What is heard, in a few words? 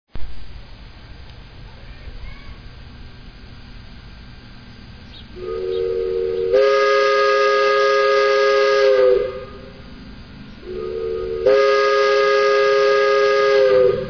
Hancock 4700, Horn